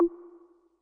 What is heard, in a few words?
scrub, Yamaha Tenori-On; Scrubkit